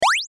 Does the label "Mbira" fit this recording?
No